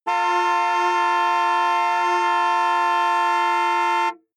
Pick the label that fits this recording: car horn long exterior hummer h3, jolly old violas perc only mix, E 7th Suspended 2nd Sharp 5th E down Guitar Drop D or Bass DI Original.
car horn long exterior hummer h3